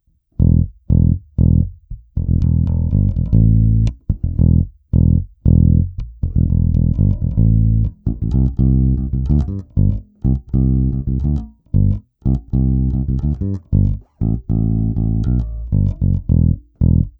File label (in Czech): Bonusová nahrávka ve stejném pořadí jako výše, baskytara